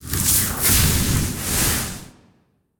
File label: Hero Deal Damage; arcane